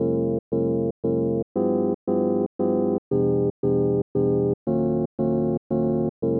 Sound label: synth; Pony Fan Music Remix Stems